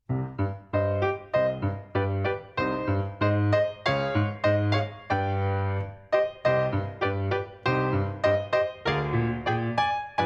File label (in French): voix et piano; IUAV